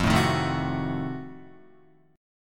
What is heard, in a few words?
F 7th Flat 9th F down Guitar; F7b9 strummed